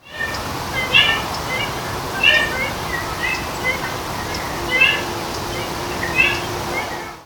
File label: fallow deer contact calls